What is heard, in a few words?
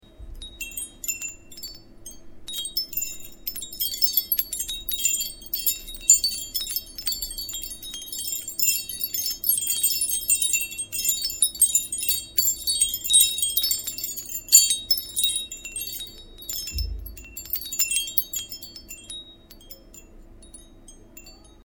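wind chimes small; percussion veterok; veter maly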